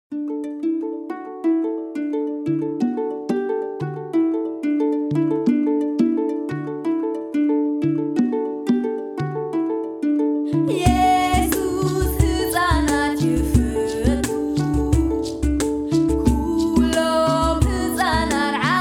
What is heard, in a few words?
African Gospel